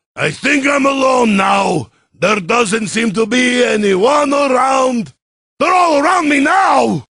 Is Calling Rap

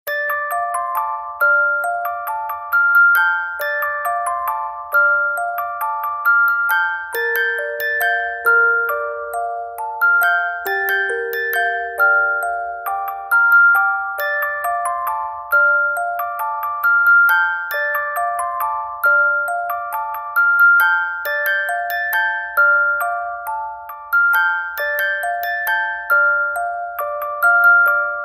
music, ringtones

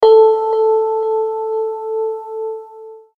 tuning fork

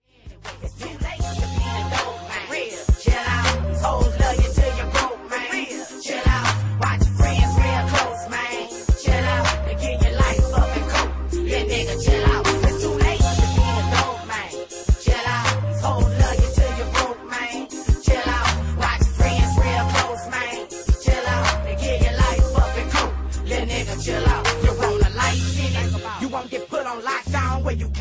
chill out

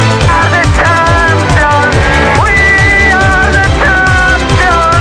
Is Calling Ska

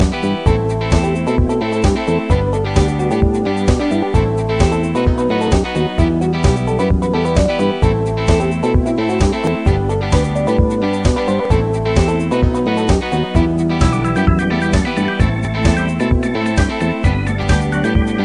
1980s Disco Song

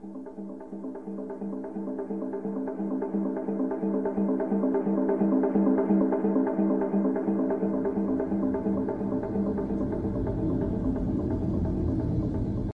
Drums; Predator

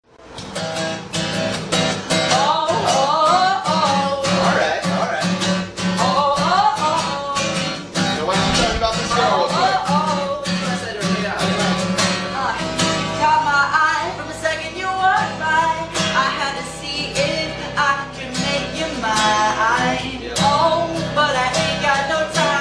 acoustic with no talking

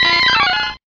mankey cry

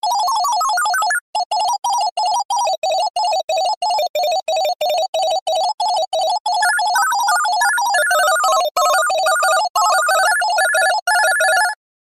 metal ring; ringtones; rock

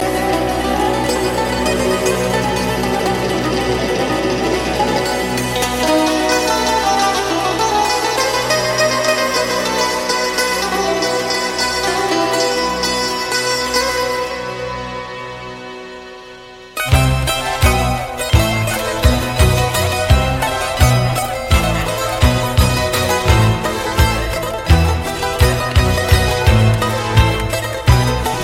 Swinging Jazz

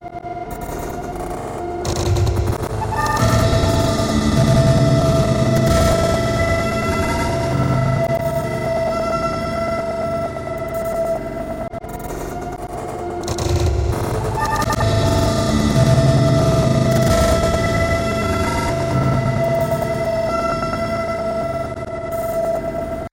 New wake up alarm

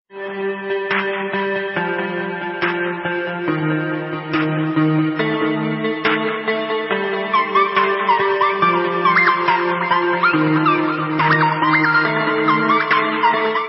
ringtones electronic

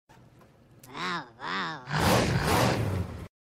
minion car

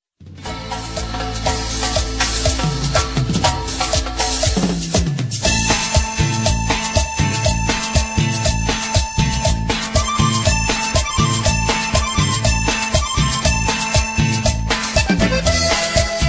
cumbia

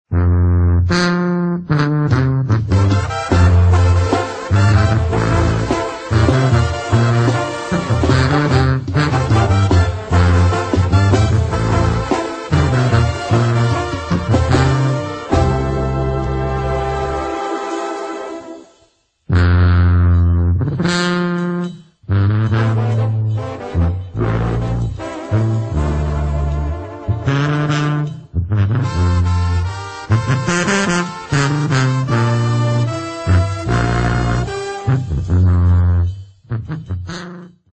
karaoke

